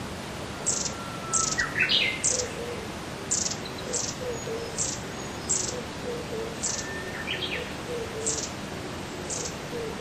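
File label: long-tailed tit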